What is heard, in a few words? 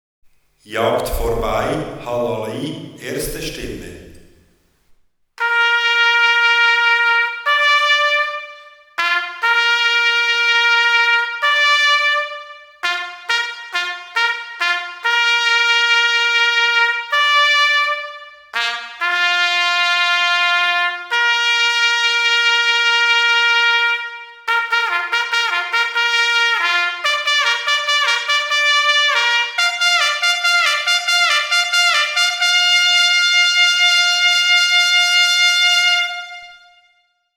Halali